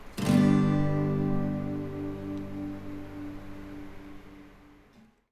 Gsus4, guitar

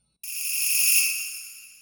suzu

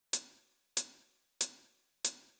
hihat

